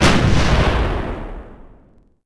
Artillery Fire; Civ4 warlords

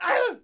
Knight Hit Vox; Civ4 warlords

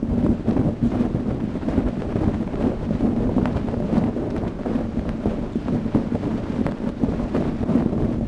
candles; World of Tanks Blitz; karelia halloween

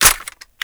reload hit, foley; p90

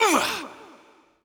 player lighthurt

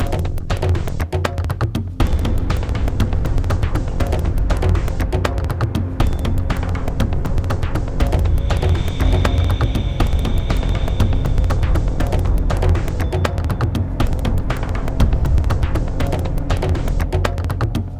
music suspense action

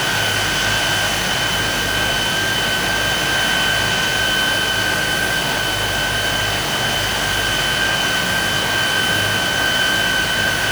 Aircrafts Engines Mig15 Front Engine; DCS

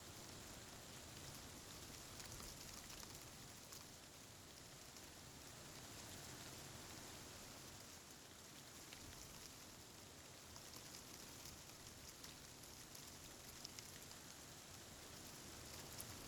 ambient tropics; War Thunder

